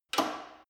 gym bicycle saddle adjust lock; sport freizeit; fitnessstudio

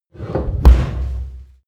oven door close low

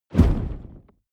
fire whoosh small dull

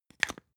spice jar smooth close creak; industrie wirtschaft; gastronomie

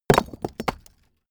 stone small impact

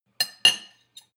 cutlery on plate clatter and scrape, gastronomie; soundpool

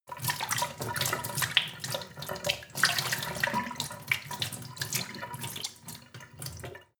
sink water pot drip

